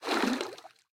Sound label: Floor Sink; Boomerang Fu